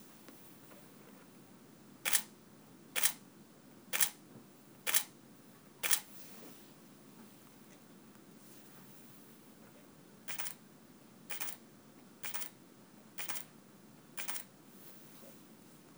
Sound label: 5d3 shutter; 5diii